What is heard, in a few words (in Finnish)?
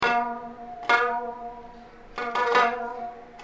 kuoroefekti